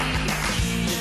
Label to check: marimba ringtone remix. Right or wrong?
Wrong